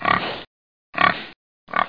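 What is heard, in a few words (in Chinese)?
zhu jiaosheng, pig grunting; jiachu jiaosheng; jiaqing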